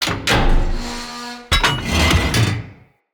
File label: Siege Engine Cannon Warning; Weapons; Enemy; Artillery; Ode Siege Engine